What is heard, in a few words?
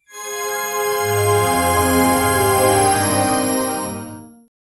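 welcome screen introduction; 3d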